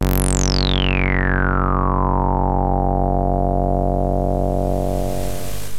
303 FILTER, Transwaves; Soundengine - CSR-000 The Art of Transwaves

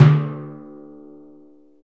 PRC TIMPAN; Roland - LA Composer vol. 1

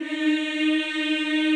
CHO ES3, Eeh; Eeh + Ehh

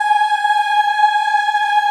G#4 child eh, Choir; USB Soundscan vol.28

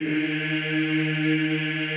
EEH D#2, Extended Classical Choir; Best Service

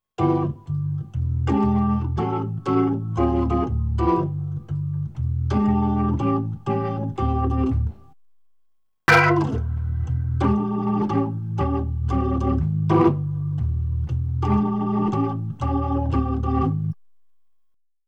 B3 Organ; Masterbits CLIMAX 8

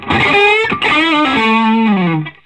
Vintage Blues Guitar, SOLO

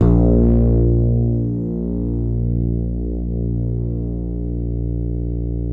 MIDIFRET A#1, Bass, MIDIFRETLESS; Masterbits CLIMAX 7

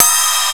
CYMBAL STICK; FAIRLIGHT CMI IIX

